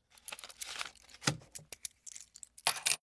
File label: Bills Coins Movement; Fairlight Sound Lib